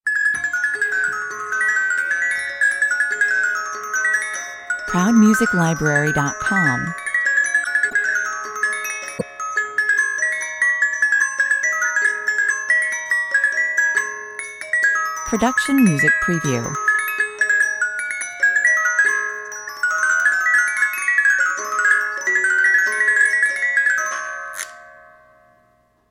fairy dance music box

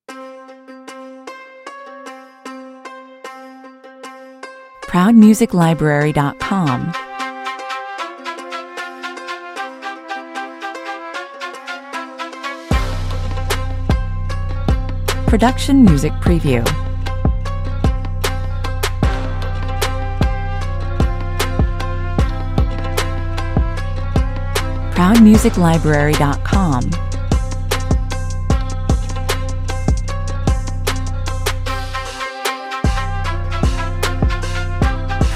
trap

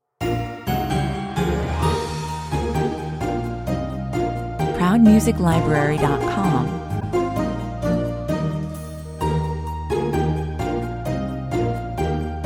no drum kit; trimmed intro

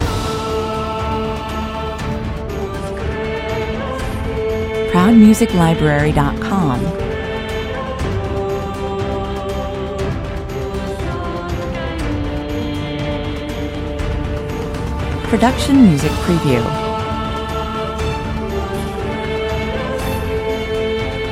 full with choir and solo voice

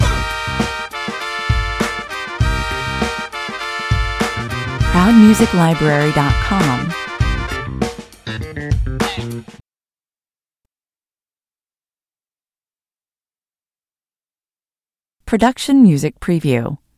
funkin yell loop

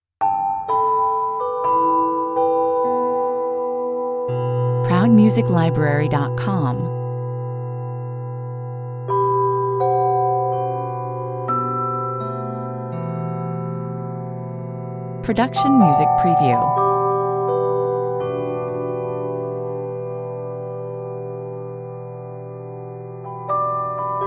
serene intro bells 113 bpm

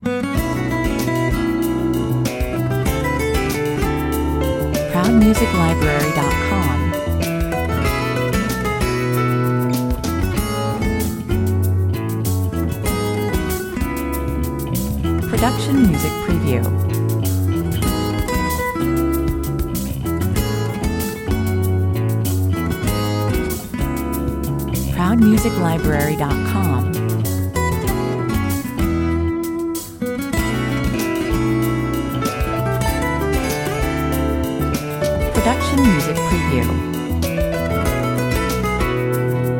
early riser

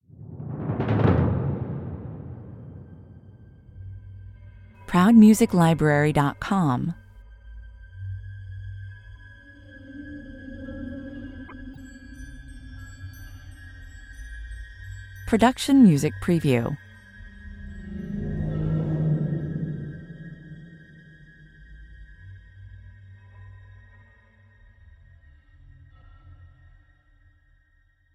Hushed, Rhythmic

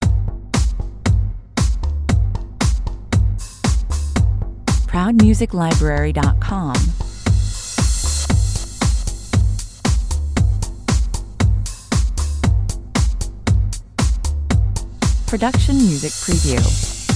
(bass and drums) 118 bpm

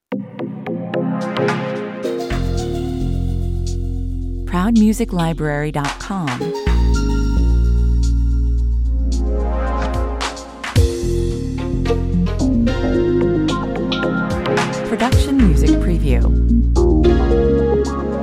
news jingle 110 bpm

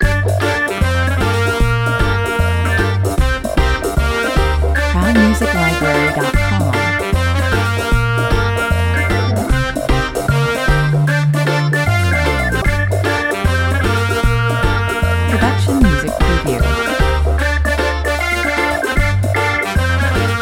bradipo reggae 87 bpm

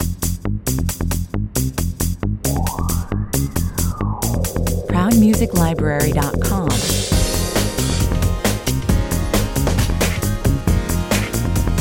late groove, 135 bpm

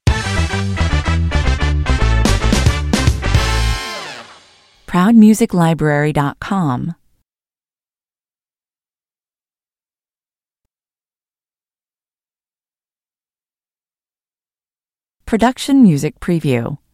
stinger

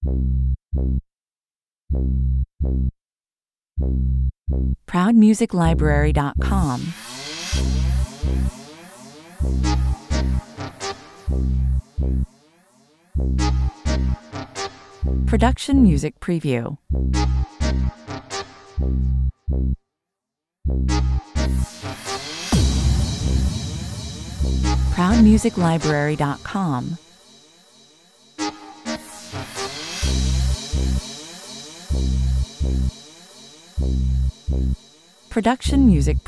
electro pimpin tension 128 bpm

